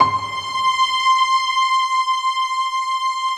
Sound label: piano string; Sound & Vision; Gigapack I CD 2